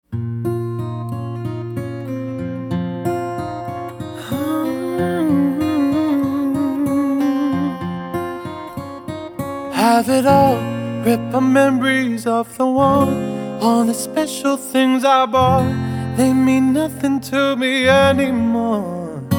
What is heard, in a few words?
acoustic